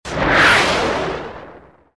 missile flying

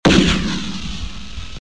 apach rocket

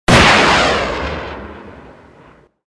grenade launch 6g30

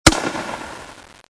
grenade launch

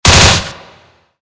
g36 burst

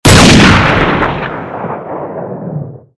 akfire single

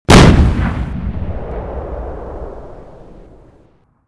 gun

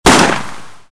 glock

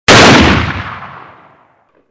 akfire single

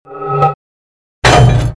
at5 reload

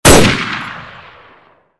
m16 single

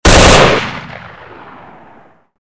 ak74 burst

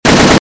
m60 mgun

